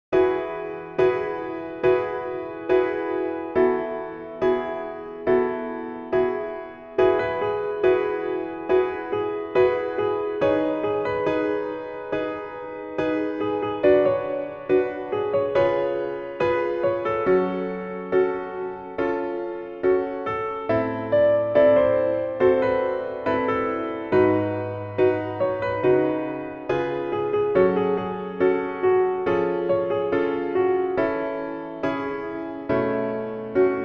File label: Piano